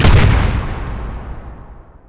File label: land mine; techies